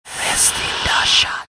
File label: hallow, ambient; Garry's Mod; tf2